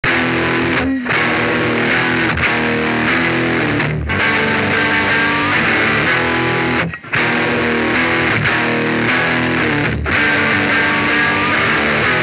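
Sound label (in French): guitare saturée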